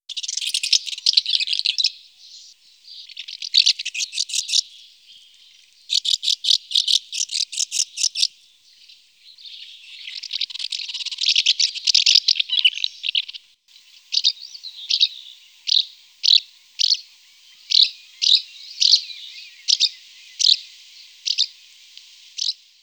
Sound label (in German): Mehlschwalbe